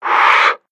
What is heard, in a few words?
smell; video games; player; taunt; grilled gunman; source engine; tf